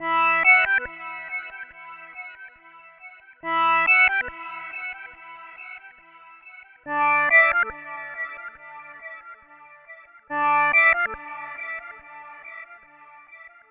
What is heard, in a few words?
140 3 seq Melody Original